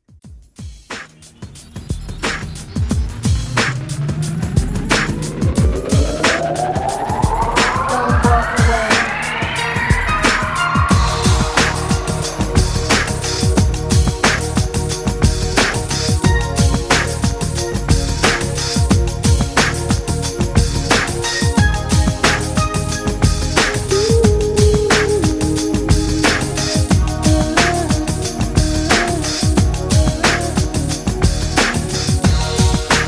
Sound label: 90s 90 Bpm